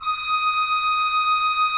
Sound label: HGRP D5, Cathedral Organ, HOLZGEDACKT; Propeller Island